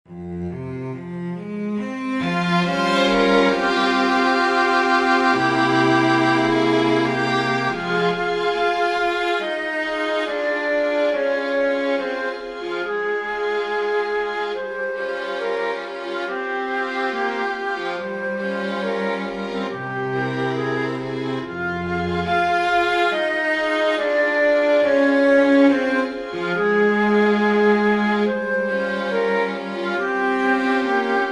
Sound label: flute strings; massenet jules